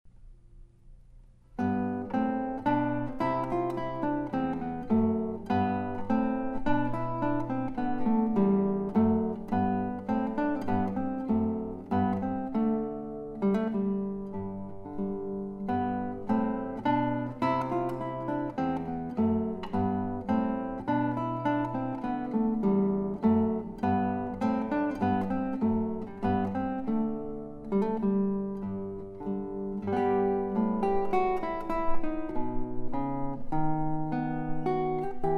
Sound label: Minuet in G